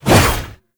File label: Staff Swing Sweetener; Warframe